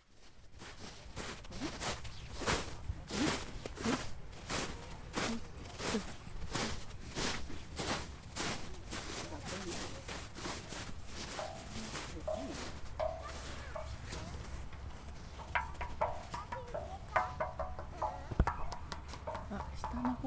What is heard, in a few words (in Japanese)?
kama wo tataku; 釜をたたく; たまちゃん釜をたたくの巻